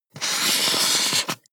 marker mark hard long

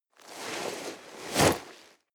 nylon leather hard short

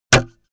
sword hit wood

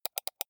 computer mouse left click double medium

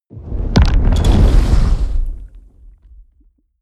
fire whoosh experimental large

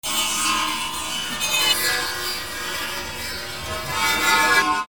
scratch

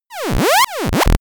arcade play

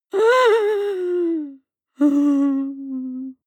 breath female whimper breath

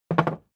hammer wood put down

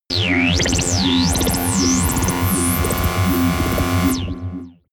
alien synth

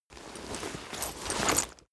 leather metal handling short

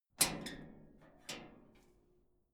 metal gate latch

